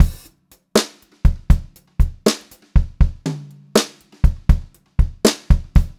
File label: Brushed Drum Loops; USB Soundscan vol.39; AKAI